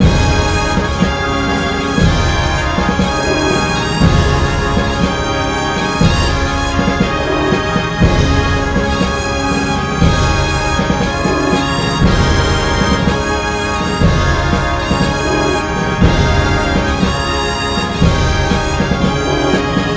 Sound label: music pageantry loop; mcdemo